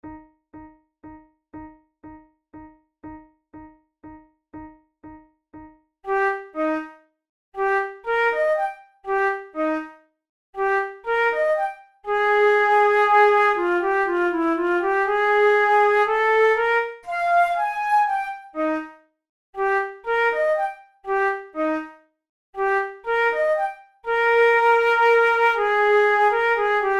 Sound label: methode de flute; tulou